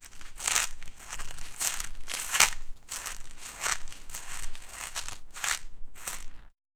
animatronic walking

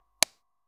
interface

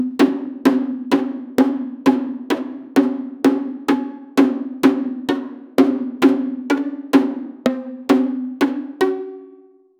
instruments bombo orque; rhythm

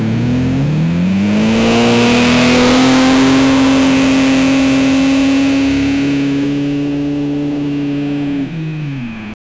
loud aggressive motorbike

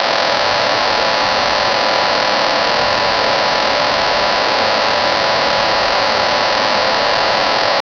crackling sound, electricity; create a crackling sound

